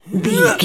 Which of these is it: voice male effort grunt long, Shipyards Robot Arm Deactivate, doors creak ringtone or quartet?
voice male effort grunt long